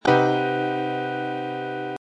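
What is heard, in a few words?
CminM7#11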